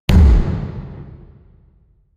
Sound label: explosion, fireworks